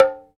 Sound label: Bongo Hit; Sonic Foundry (Sony Creative Software); Joe Vitale Latin Percussion